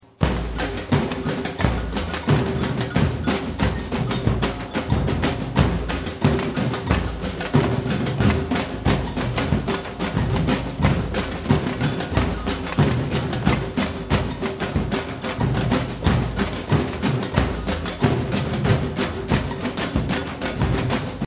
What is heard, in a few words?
groove